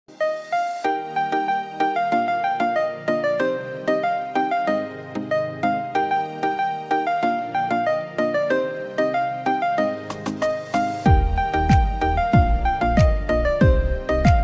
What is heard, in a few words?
Song Instrumental Ringtone